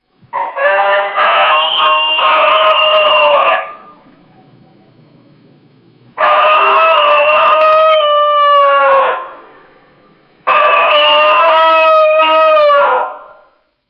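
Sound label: hen and rooster voice, farm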